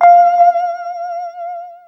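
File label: extra lead (spooky); Sonic Foundry (Sony Creative Software); Ugly Remnants VOL-2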